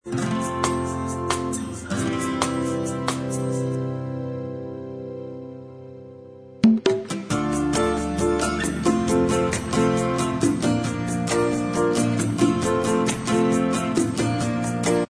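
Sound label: bossa style, instrumental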